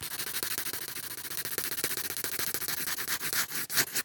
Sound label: Handwriting Felt Pen; Stabilo; soundbits